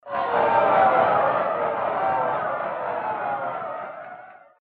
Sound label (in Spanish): publico buuu